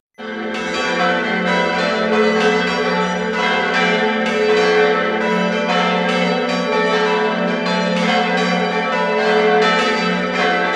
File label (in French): cloches volee